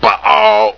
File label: lose